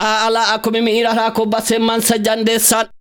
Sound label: VOCAL LINES; Best Service; World Colours